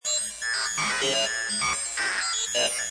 Space Scifi Sound Malfunction, Sci-fi, strange machi; Space Scifi sound - Malfunction: Sci-fi, strange machine, computer display panel showing error messages or decod